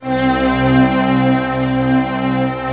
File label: STR, YAMAHA, Analog